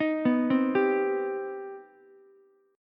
C Minor Major 7th, Ukulele; arpeggiated; 8,11,8,10; JGuitar

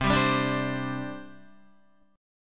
C Suspended 2nd, C, down, Guitar; Csus2 strummed

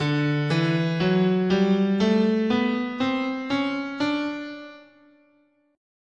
D Bebop Dominant, guitar, scale

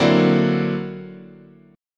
D Augmented 9th, chord, guitar

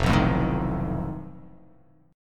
F 7th Flat 5th F down

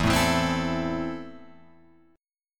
E Suspended 4th Sharp 5th, Guitar Open F; Esus4#5 strummed

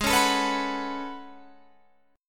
A Major 13th, guitar

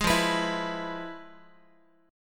F-Minor Major 7th Flat 5th, Guitar; FmM7b5 strummed; JGuitar

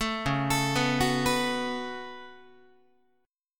C sharp 7th Suspended 4th Sharp 5th, Guitar; C#7sus4#5; arpeggiated; JGuitar

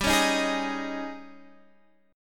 G sharp Major 7th Suspended 4th, G

